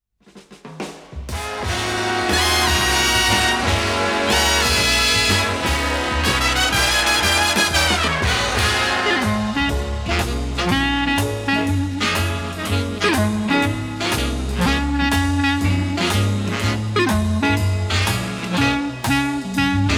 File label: Blues, Big Band